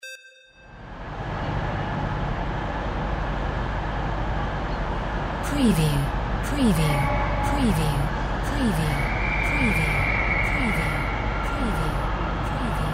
AMB TRAIN STATION TONE

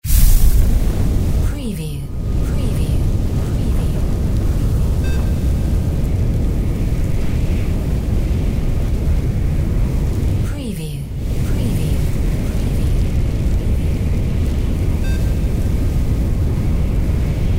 sci fi fire spell full; windy beach audio

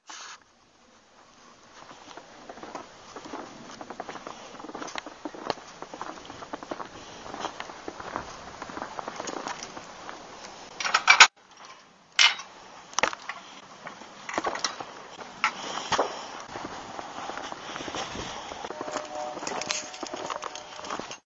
Boot steps in snow

